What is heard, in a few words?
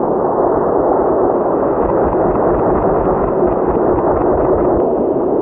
city tone; hl2